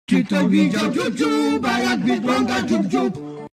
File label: tam tam tam tam